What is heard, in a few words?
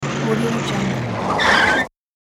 car brake